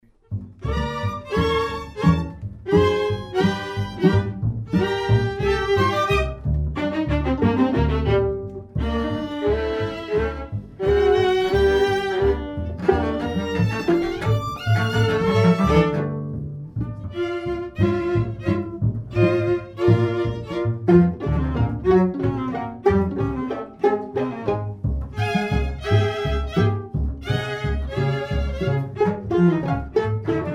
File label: sextet; klengel